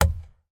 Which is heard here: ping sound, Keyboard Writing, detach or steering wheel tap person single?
steering wheel tap person single